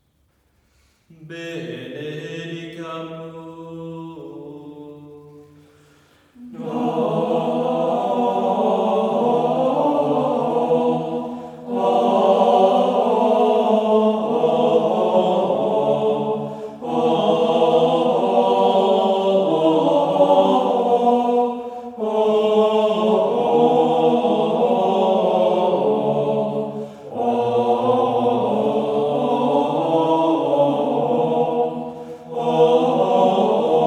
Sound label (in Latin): Benedicamus Domino à deux voix; École de Notre Dame